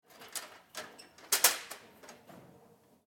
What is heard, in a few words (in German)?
Leiter wegstellen